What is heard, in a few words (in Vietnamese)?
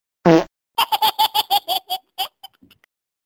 Nhac chuong tin nhan danh ram